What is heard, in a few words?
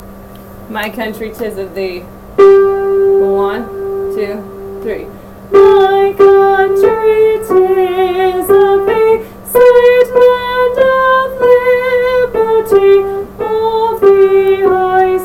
my country tis of thee key of g